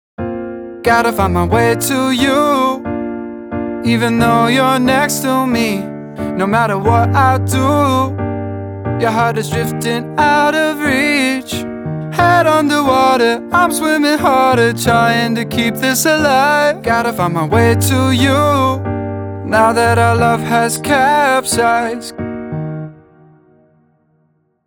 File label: Full no delay reverb